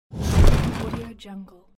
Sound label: heavy wood dragging